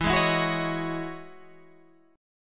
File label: E Major 13th chord